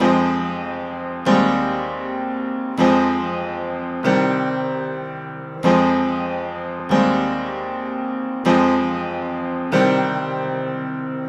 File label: Piano, 70s Analog Funk; Sonic Foundry (Sony Creative Software); What it Is! 70s Analog Funk